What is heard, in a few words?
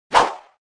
Whoosh; Cars; tractor tip